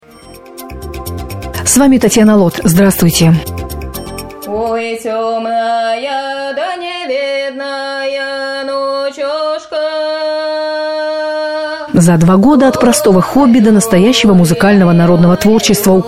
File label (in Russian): reportazh folk repeticiya; belgorod; mir belogorya; u narechiya